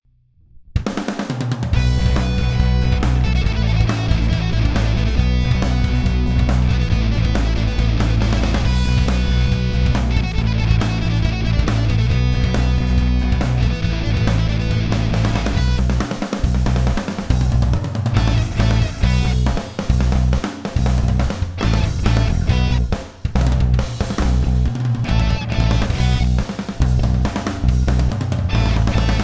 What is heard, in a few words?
Drum Solos; Genocide 2